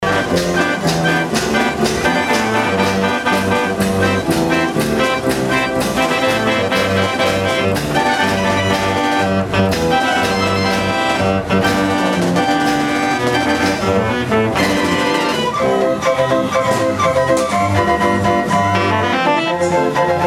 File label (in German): orgelmusik